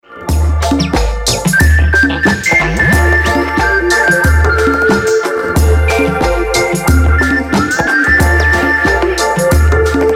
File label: whistle dub; Chilled Electronic 2